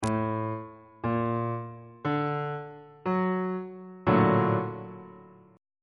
b flat augmented major 7th 3rd inversion on bass clef